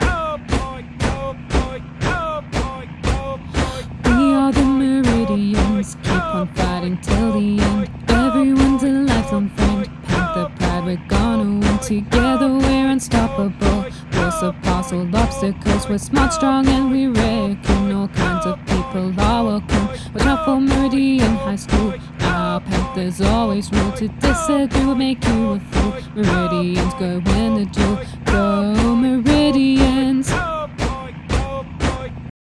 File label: acapella